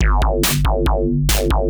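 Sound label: DRUMLOOP ELECTRO 140; Techno2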